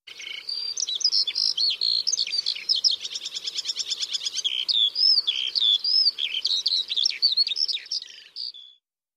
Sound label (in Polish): skowronek